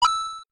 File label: coin; smb2